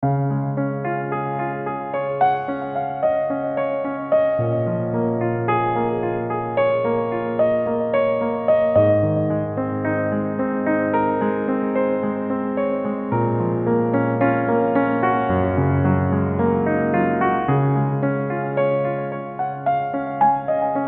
Piano Theme

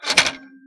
clank

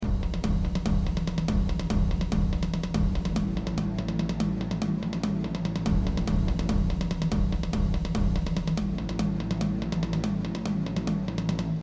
fast background

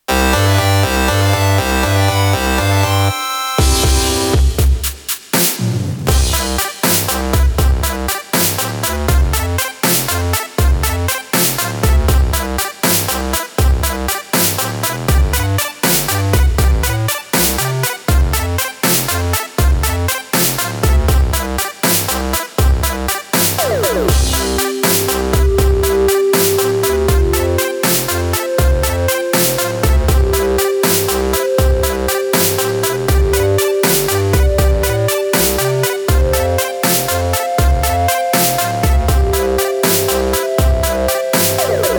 Achievment Unlocked

